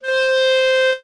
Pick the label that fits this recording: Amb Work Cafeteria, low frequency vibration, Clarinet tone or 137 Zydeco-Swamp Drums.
Clarinet tone